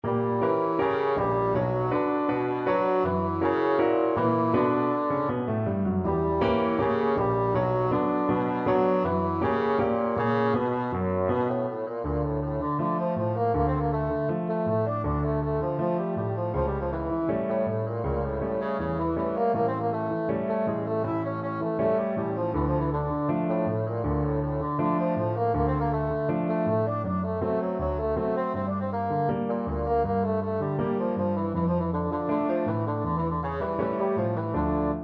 el choclo, bassoon

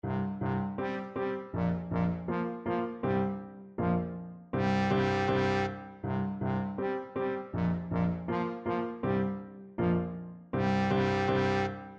blues trombone

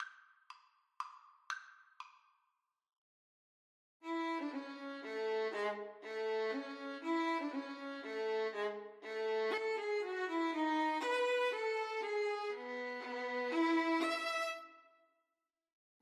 minuet, Violin Duet

